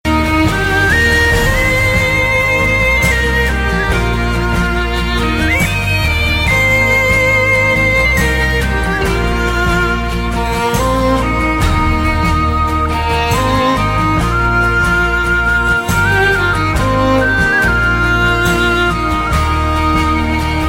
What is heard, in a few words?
calming music